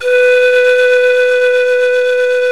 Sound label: SIKU VIB B2; The Andes